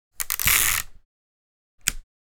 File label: Scotch tape pulling a piece of strip